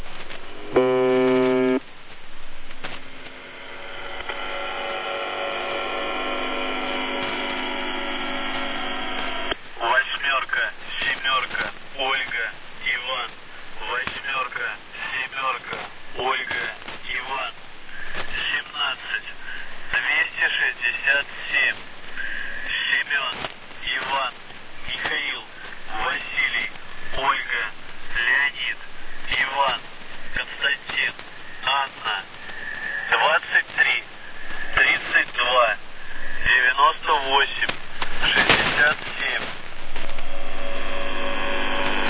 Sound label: buzzer usb msg